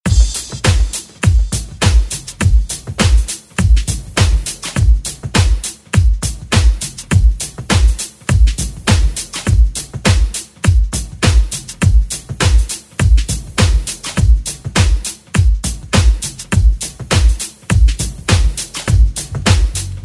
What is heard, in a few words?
90s Ext Redrum